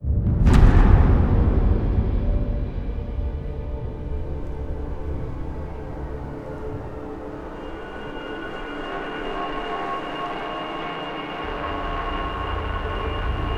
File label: Death Transition Drone